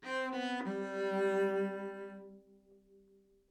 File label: live string phrases, string phrase Cmaj 100bpm